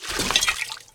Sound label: Attack; Friendly Bartender